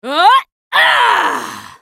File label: ultimate effort; Character Voices; English